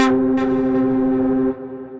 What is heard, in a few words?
Pingfifth, Percussive